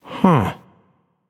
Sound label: thinking; smite; voicepack; osiris